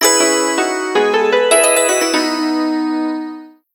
Morning Light, ringtones; Fujitsu; F-08D

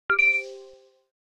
Soft notification; Nokia N85; Audio themes

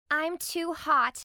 hot; ZTE ZW20 Verizon Gizmowatch 2

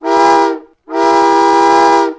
little train; Philips; Multimedia Studio

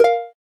gayageum, keytone; SKY; IM-A760S VEGA Racer

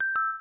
video start

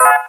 gayageum sharp; SKY IM-A830S-VEGA-Racer-2

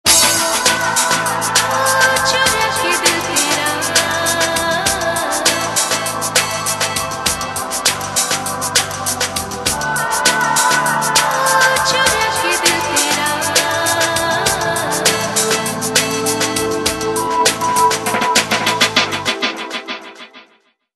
clear surface; A3100

